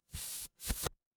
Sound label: paper fabric fabric